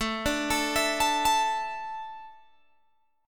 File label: A Suspended 4th, Guitar Open G